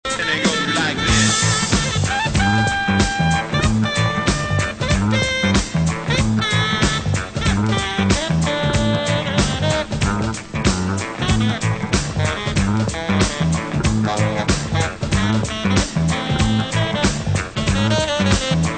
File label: downtown sax